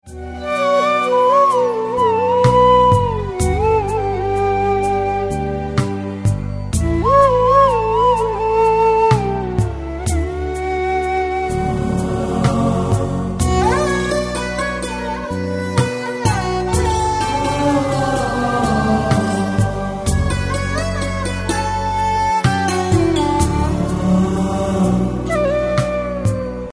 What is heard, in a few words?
instrumental, ringtone